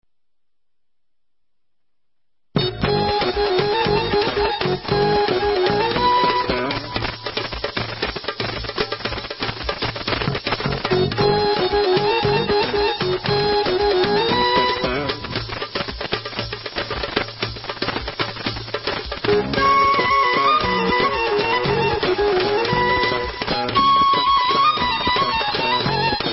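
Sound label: Instrumental, Israeli Dances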